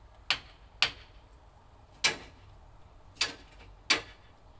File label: metal hits with a hammer - industrial; metallic